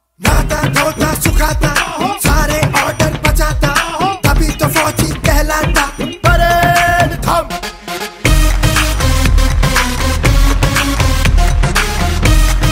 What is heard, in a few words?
bollywood hindi ringtone